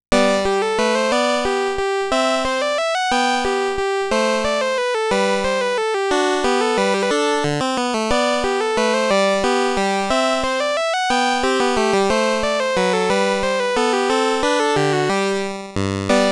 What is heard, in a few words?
piano- Minuet in G major; Little Computer People; Petzold